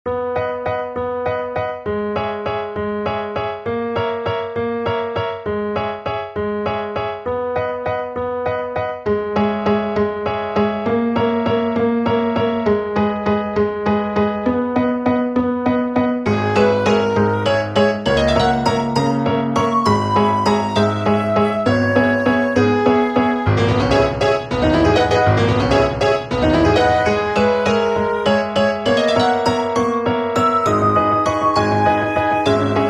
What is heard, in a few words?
waltz